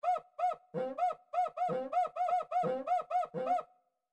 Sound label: individual drums talking drum, midi